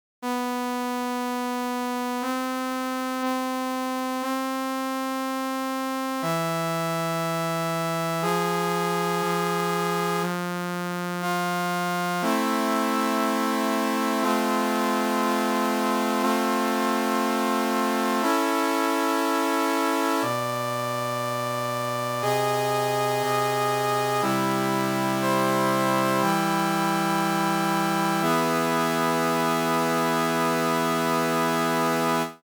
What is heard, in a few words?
enharmonico Intenso Fugue